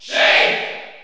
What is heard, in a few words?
Cheer French; Sheik; SSBU